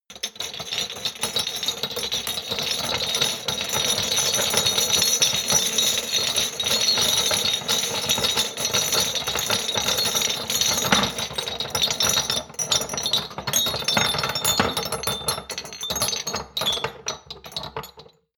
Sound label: shot glasses rattle hard; berufe; gastronomie; soundpool